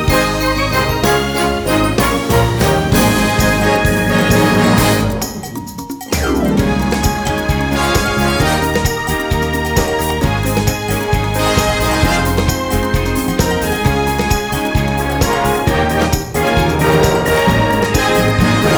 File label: Jingle Bell Medley no vocals